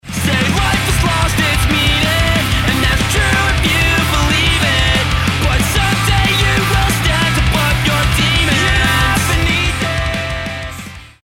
start action, music

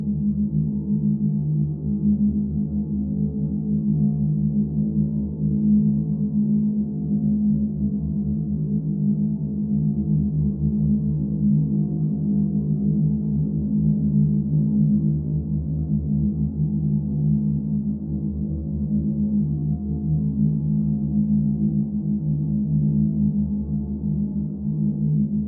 amb creep lp, ambient; Half Life Model Pack

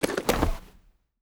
parachute pickup success; Counter Strike Model Pack; CS:GO; survival

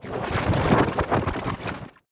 xen infest plant stalk; infestation; Half Life Alyx

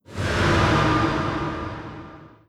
flinch wet, strider damaged; creatures

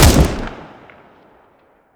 scar20, weapons; Counter Strike Model Pack; CS2

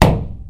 drywall impact hard, plaster; physics

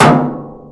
metal box impact hard; physics; Portal 2

